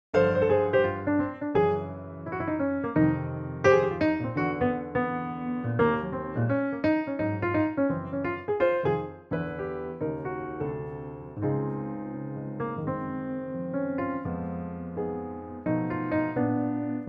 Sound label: Db piano